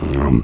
Eats; Gong; Neighbours From Hell Console Edition; GC, Xbox

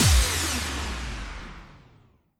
Enemy Evolution Active Attack; disney star smash; android

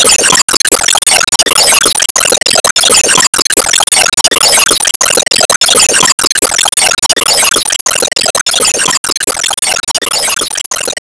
Enemy Road mechanic Active Loop

